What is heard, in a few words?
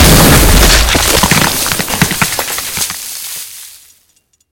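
explosion of bursting shell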